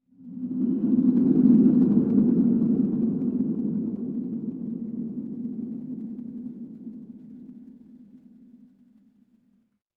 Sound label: horror wind whisper